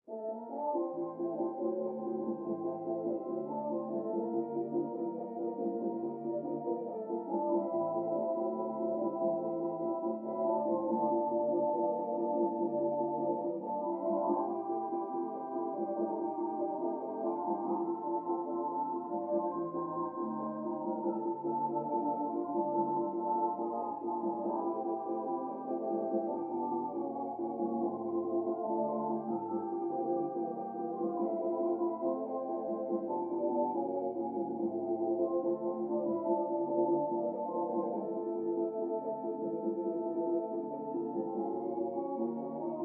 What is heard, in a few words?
Mx Elevator Latin Fr